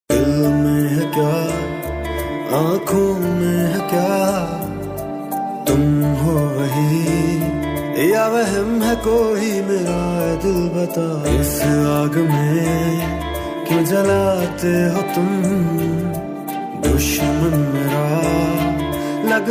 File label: OST Pakistani ringtone; Iqtidar